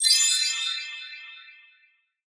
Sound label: Loot Coins Fly; portal quest; android